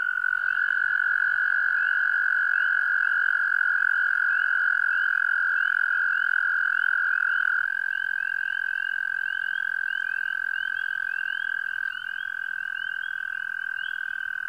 American Toad

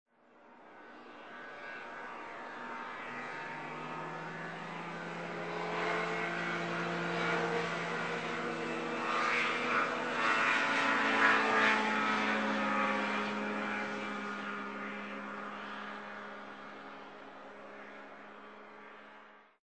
snowmobile

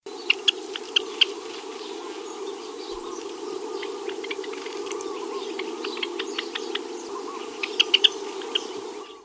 Java Sparrow

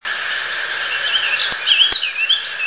Warbling Vireo

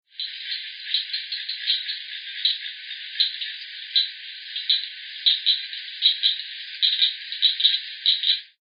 cricket frog

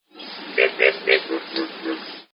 Two Pig Frogs

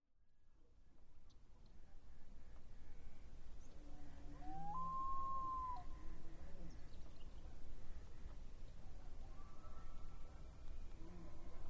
Lake Soundscape

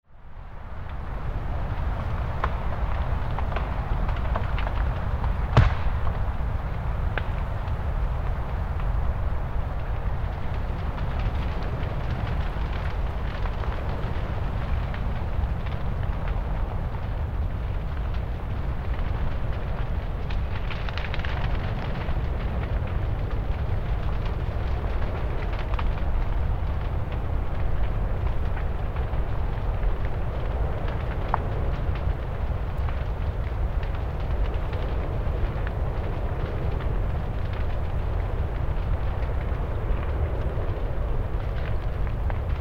Maple Fire

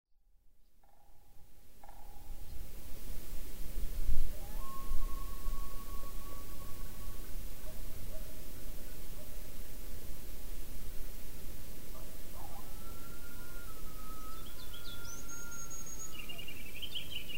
Coyotes; SM3

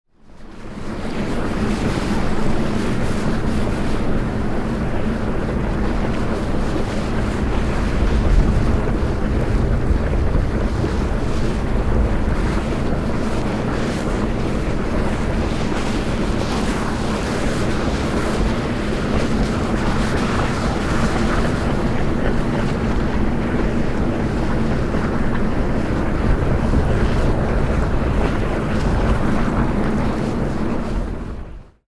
Norris Black Growler

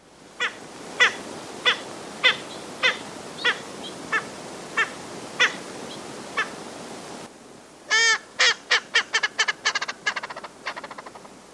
Erckels Francolin

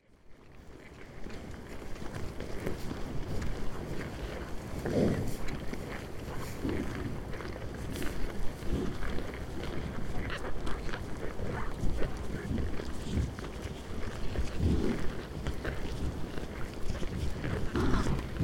Bison Eating

